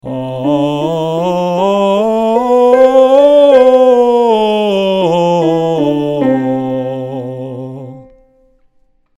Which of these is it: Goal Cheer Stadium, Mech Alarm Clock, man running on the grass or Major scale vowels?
Major scale vowels